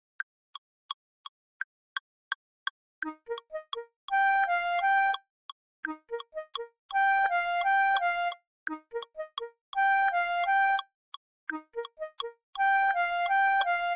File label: Melody Only